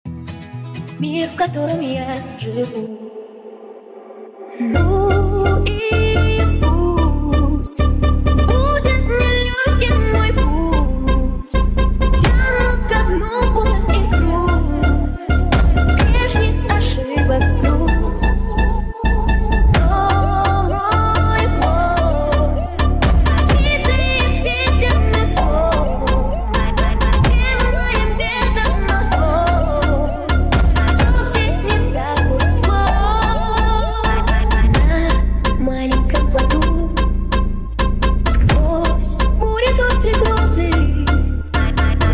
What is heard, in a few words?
phonk remix